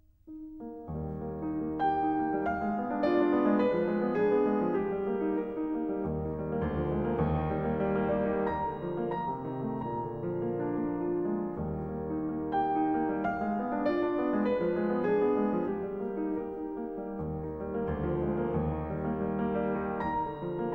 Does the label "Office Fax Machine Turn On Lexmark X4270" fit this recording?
No